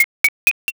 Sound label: nail